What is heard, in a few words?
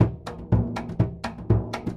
surdo, drum loops